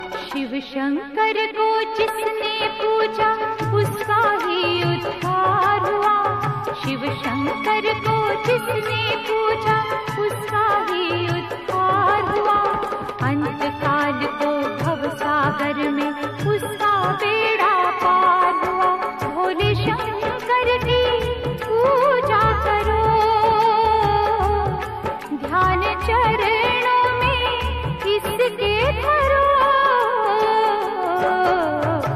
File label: female ringtone